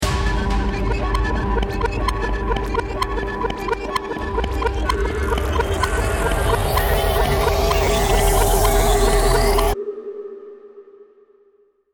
bomb ten sec count

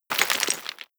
debris concrete, bullet impact debris

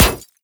manhack, bullet impacts; Half Life Model Pack

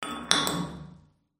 glass knockover; ambient; amb oneshot

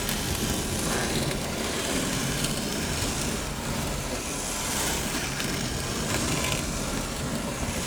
scrape, computer; physics; props

